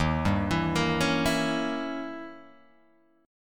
D sharp 7th Flat 9th, D sharp, down, Guitar, Open G